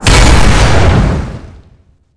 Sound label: reverse gravity; glasgavelen